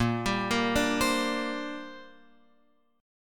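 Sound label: A sharp 6th Add 9th chord, Guitar, Open F tuning; A#6add9 arpeggiated; JGuitar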